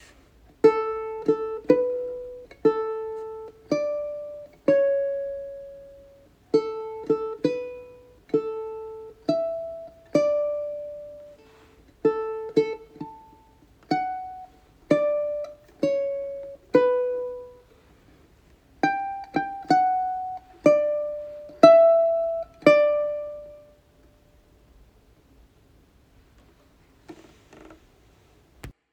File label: Uke Happy birthday picking; Uke book